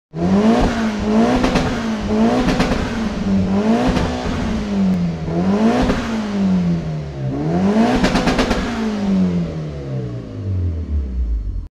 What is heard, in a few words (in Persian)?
Tucson, ماشین